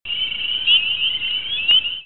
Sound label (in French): Ambiance jungle; bruitage